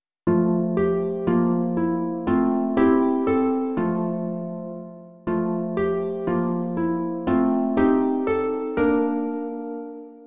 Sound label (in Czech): harfa; Slunce denní již nám hasne; Imaginativní verze